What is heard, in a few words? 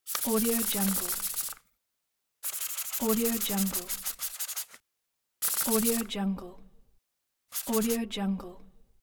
paper friction